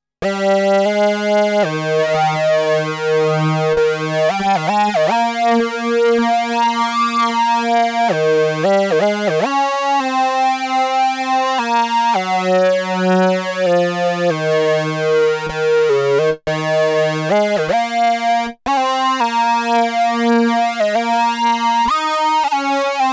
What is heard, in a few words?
MOOG